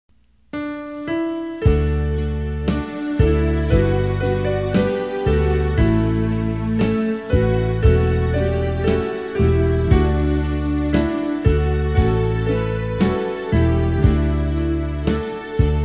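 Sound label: soprano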